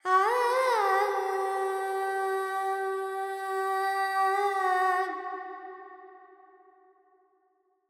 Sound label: Carnatic Female Alaps, Panthuvarali, 100 G, Verb; Sample Banks